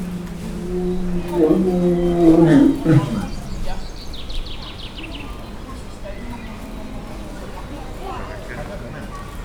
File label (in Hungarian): oroszlán; pecsi zoo 2017